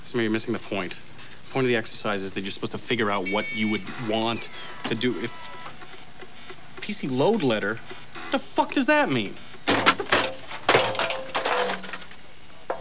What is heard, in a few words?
Fax